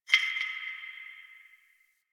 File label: Interior Distant Marble Hit; Warframe; Ambience; Nineteen Ninety Nine